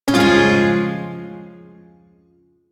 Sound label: Shawzin Phrygian Chord; Warframe; Duviri Shawzin